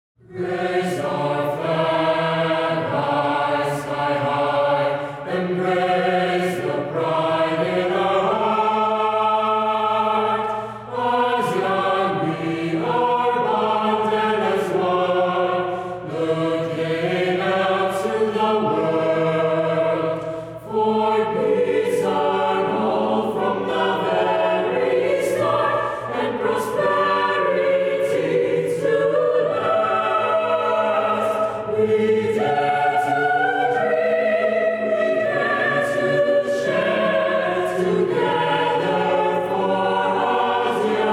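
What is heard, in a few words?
without accompaniment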